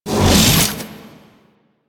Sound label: Thurible End; Warframe; Priest